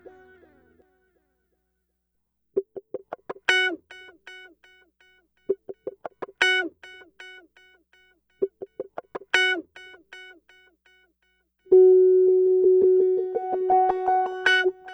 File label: Guitar, Funk